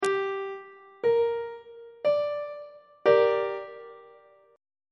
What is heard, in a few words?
f double sharp minor chord on treble clef